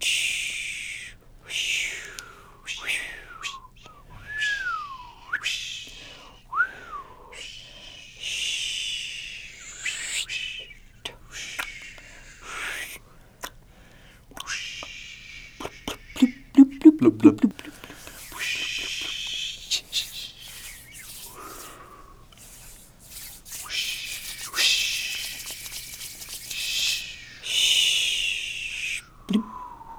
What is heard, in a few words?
Fish Sounds